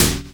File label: Minimal Perc; Minimal Percussion